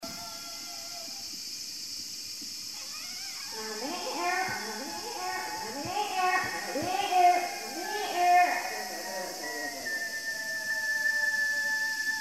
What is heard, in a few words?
cicada